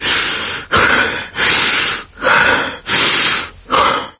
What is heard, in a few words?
heavy breathing; voice; source engine; left 4 dead 2; survivor